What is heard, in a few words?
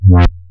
Synth Shot; Vengeance Samples